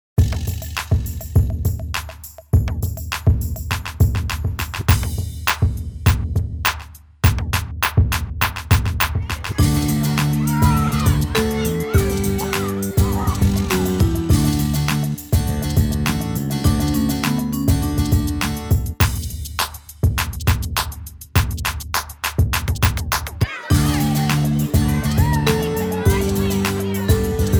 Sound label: karaoke